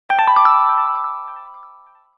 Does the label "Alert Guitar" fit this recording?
Yes